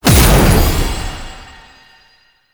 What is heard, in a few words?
Cast Impact; Warframe; Uriel; Remedium